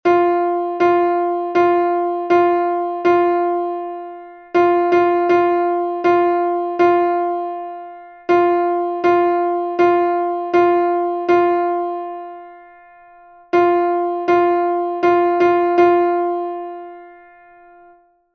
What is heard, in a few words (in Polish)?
Temat rytmiczny